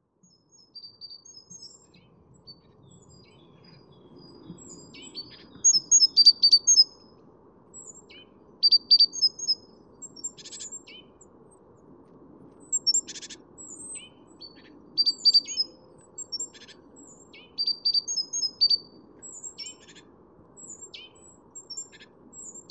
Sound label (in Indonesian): gelatik wingko menjaga wilayah